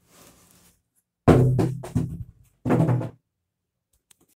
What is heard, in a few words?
objects falling